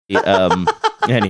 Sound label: laughing while talking